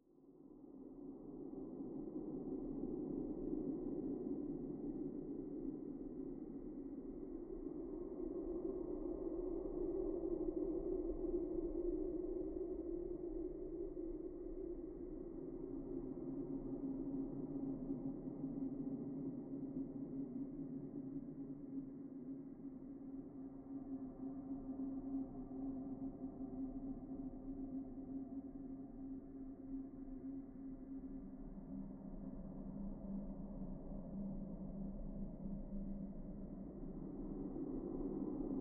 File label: Wind, foley sounds; arctic wind